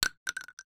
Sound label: cart sg